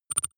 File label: click stutter soft; maschinen; signale; soundpool